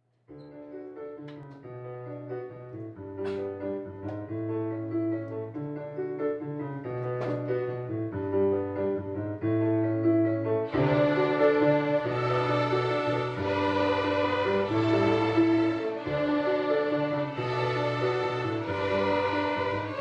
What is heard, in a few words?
rock ballad